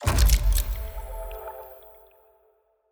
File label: UI Puzzle Completed; A Year of Rain